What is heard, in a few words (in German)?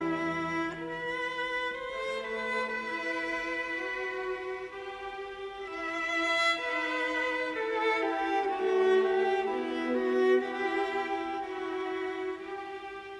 konzert fuer viola und streichorchester g dur andante